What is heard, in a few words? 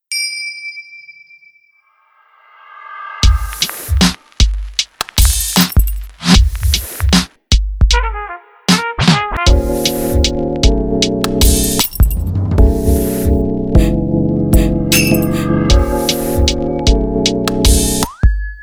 jingle instru